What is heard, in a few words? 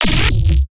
fx glitch